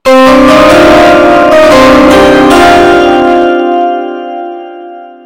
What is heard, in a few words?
nfl draft chime bass boosted